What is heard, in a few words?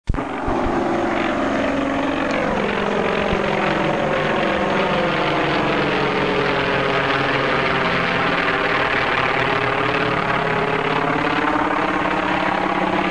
S-2 Flyby